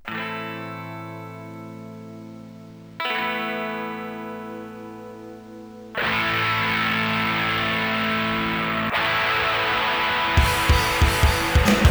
hard metal; DIGI Booster (DIGI)

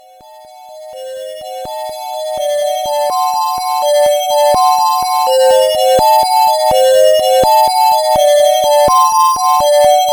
waltz; MED

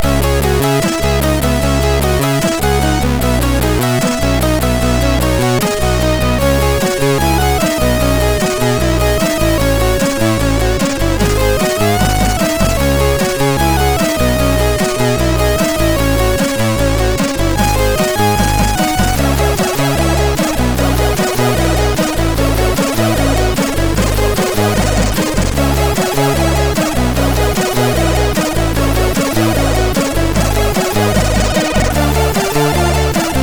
sid MOS6581R3

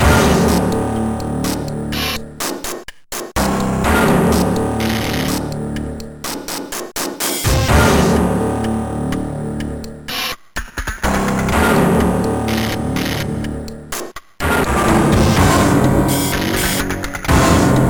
game music; Valhalla 2

